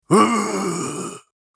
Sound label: "Ahh", samurai voice; samurai voice selection 2